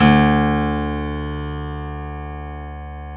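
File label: grand piano; B-GRAND PF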